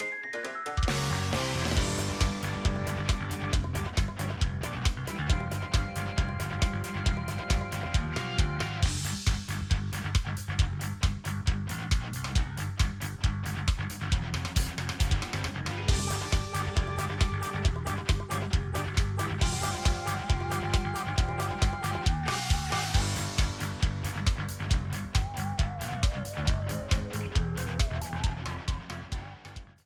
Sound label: Battle Thinking